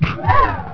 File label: walker giggle; hurrican